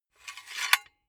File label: silicone gun empty pusher pull out fast; berufe; handwerker; soundpool